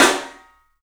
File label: PIC SNR L, PICOLO ROOM, 3D Drums; 3D Sound